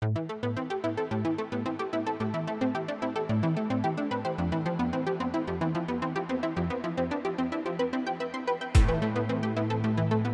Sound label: Boss Background Music; Itadaki Smash